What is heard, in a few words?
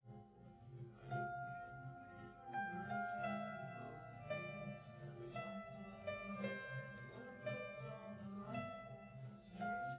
nodrums liverec 250ms 5cm sim